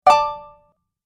Message Alert, beep

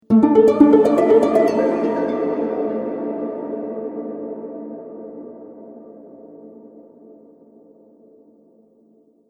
Harp Discordant Delay; Musical

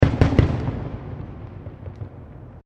Fireworks Multiple Explosions

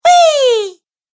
Cartoon Girl Weeee; Cartoon Voice Sound Effects

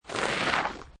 Snow And Ice Slide On Snow; Weather

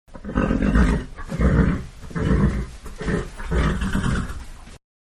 Horses Grunting several; Horses (Sound Effects:Animals); Manhattan Production Music

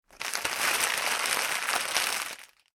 Plastic Packaging Movement; Plastic Packet / Packaging Movement; Materials; foley; McKinney Sound

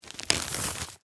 Bag Rocks Open, Foley, Plastic, Pebbles; Sound Effects:Materials

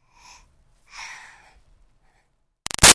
Baby 6 Month Old Breaths Yawn; Babies (Sound Effects:Voice); Big Room Sound

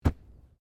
Body movement Organic Impact Light; Body movement foley to simulate falls and deaths; Wrestling And Struggling Sound Effects

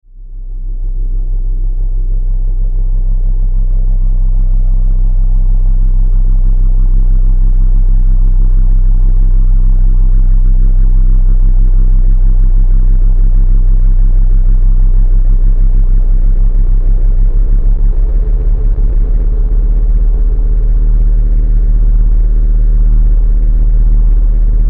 Drone Dark Bass Distortion Static Crescendo; Blastwave

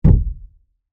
Impact Wood Large, Heavy; Wood (Sound Effects:Materials); BlastWave

